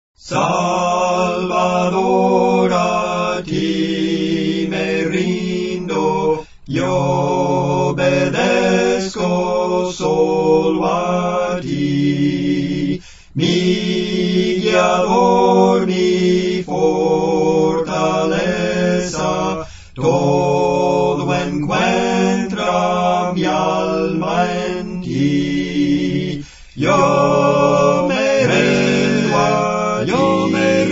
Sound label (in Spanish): 4 Voces, Cantos Espirituales; Las cuatro voces